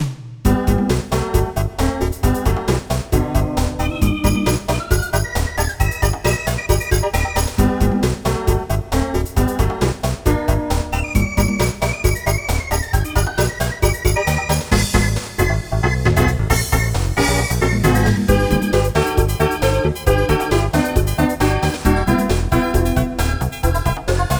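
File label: background music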